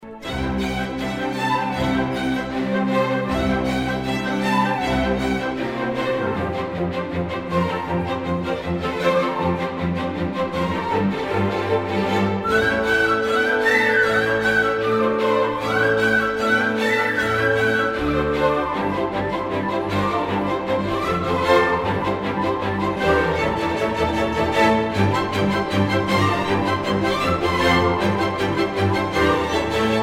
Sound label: Bartok Romanian Folk Dances Fast dance Allegro vivace